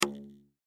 Rubber Band Stretch, Rubber Band Stretch Pluck Single; foley; Miscellaneous Office; Blastwave